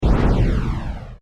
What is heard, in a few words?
Science Fiction Plasma Ray Cannon; Sci-Fi